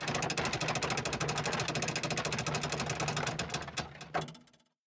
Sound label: Spin; robin hood